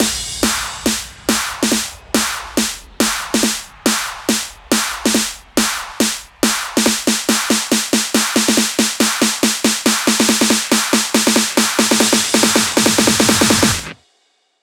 140BPM 8Bars, HandsUp & Trance Fills; Nitro; Vengeance Samples

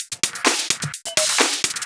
Houseloops 128BPM; Vengeance Samples

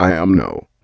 Vocal Phrases; Vengeance Samples; Vocals

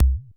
Roland E Blip, Roland S100

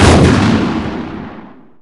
Impacts

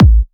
128BPM Air Raid Kick; VFH1 128BPM Air Raid Kit

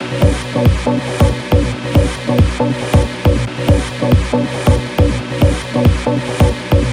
Special Sounds Root B 138 BPM; vengeance essential club sounds-3

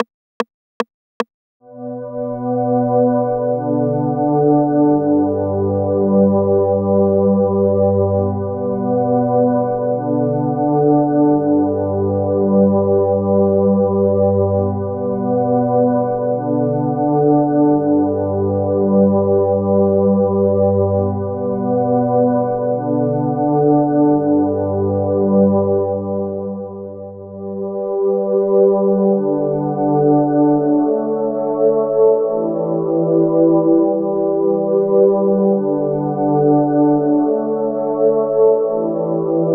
Strings (warm), 150BPM; Vengeance Samples

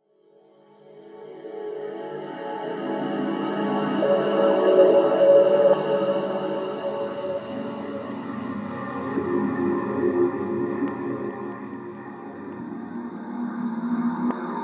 Pad; Ghosthack

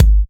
Kicks; Vengeance Samples

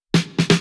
Fill 128 BPM; Vengeance Samples; VEH1 Loops

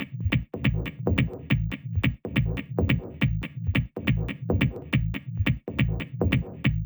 Beast Kit 140BPM Loop, Drums & Perc; D minor; Vengeance Samples

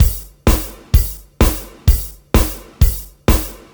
Housebeats, 128BPM; Vengeance Samples; VEH2 Loops

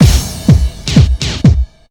drum loop 125, fxdrops; house; PS-28 Vocal House Samples; drumloops

